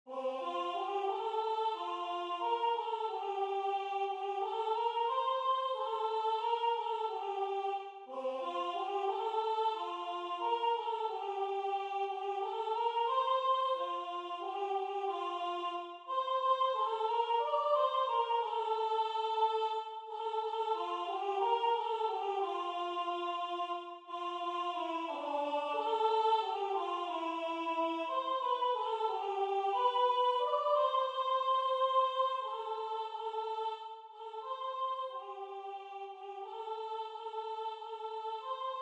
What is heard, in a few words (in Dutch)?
Vrouwenstemmen; Kerstnacht die schone